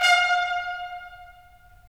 BRS, Tps Stacc; Roland - LA Composer vol. 4

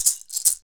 Maracas Up Down

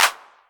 Clap, Novation Drumstation; Novation DrumstationV1

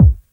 BD, Dance Mega Drums; Best Service

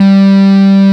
G2, SYNTHESIZER ANA LEAD; AKAI S6000 CD-ROM - Volume 1

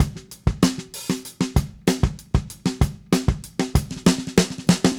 Drums Fill, 70s Analog Funk; Sonic Foundry (Sony Creative Software); What it Is! 70s Analog Funk

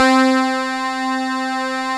Juno Clavi; Club-50 - Foundations Roland; Clavinets

